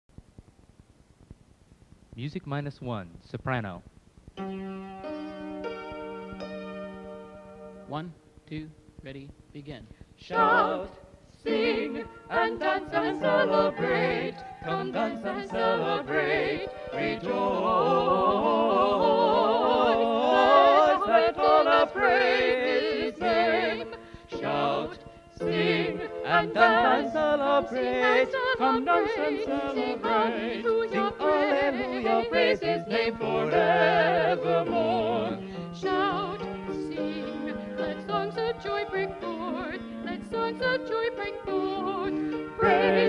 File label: soprano; praise the lord